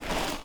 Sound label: dirt jump, footsteps; chief